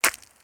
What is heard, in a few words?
Frozen Arrow; Game Weapons; Military; Game Essentials